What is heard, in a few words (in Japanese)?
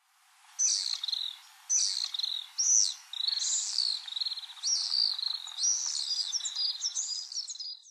amatsubame, wakayama, koe; kuroda